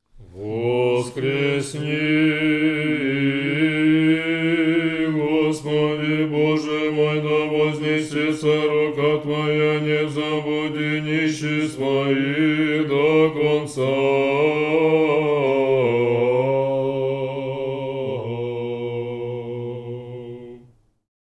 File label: prokimen tone 3